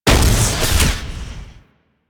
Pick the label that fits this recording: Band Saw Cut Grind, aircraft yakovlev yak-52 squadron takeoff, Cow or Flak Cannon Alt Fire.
Flak Cannon Alt Fire